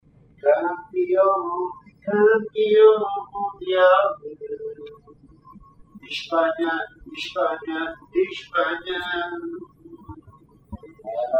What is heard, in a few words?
singing spanish dude